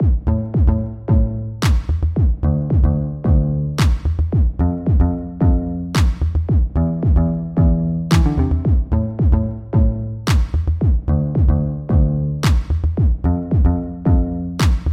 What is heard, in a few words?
Classic House; Brocloud Sonic Identity